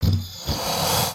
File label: Pet Croc Happy; creatures pets; Lego